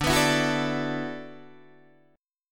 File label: D 6th Add 9th D, Guitar, Open D